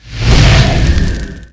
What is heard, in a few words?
fire shot large